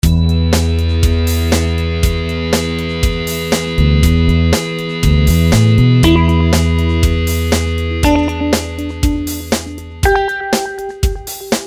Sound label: Beat Rock Distortion Guitar Bass Drums Organ